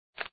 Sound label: deal, chess